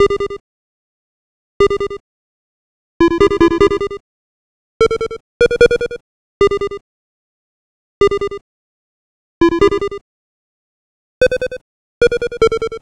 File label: Atari 2600 Synth